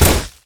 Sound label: impact; dota; queenofpain